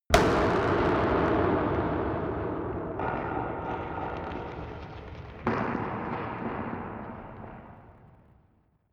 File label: gun dark pop gun distorted; schusswaffen